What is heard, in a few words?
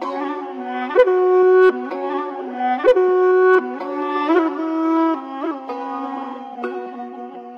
flute